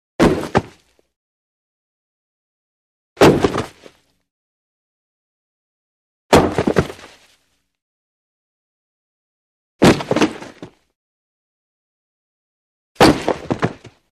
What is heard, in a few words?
Heavy Body Falls On Wood Heavy Low Body Fall On Wood; Hollywood Edge Foley Sound Library